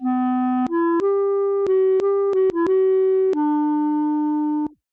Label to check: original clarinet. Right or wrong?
Right